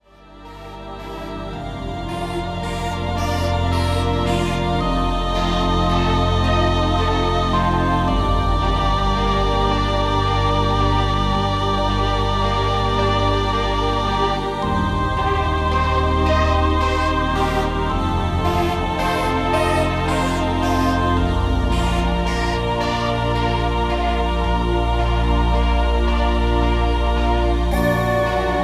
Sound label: music for relaxation; schubert